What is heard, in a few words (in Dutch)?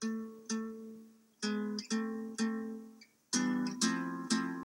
Muziekfragment Oldies